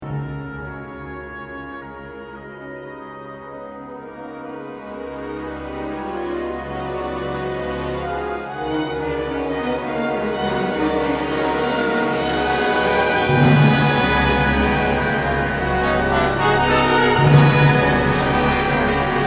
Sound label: Fuga